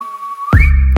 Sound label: whistle; poe